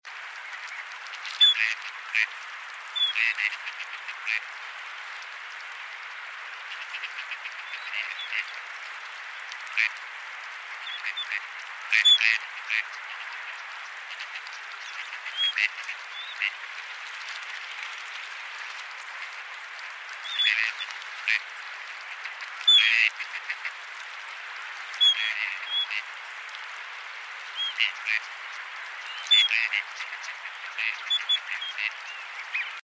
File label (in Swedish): Snatterand